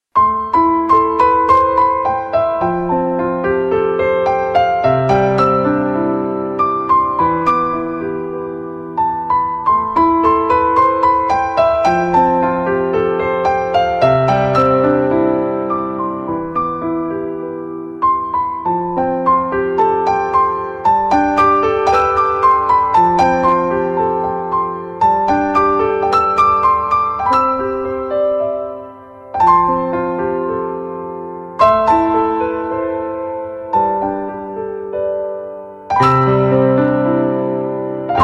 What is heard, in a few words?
piano